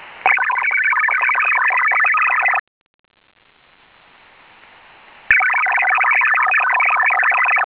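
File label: MIL-STD-188-141A Link Protection; MIL-STD 188-141B 2G MFSK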